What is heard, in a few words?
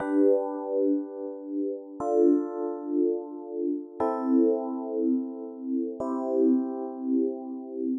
chord